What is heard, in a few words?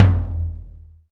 DM5 Tom Hi, Alesis DM5 drum machine